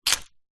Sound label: vehicles keys car pick up from surface